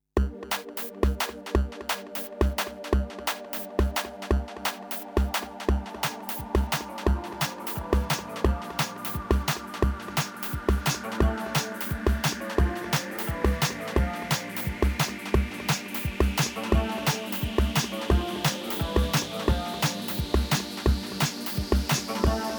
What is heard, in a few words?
TRAP promo mix; Forest Festival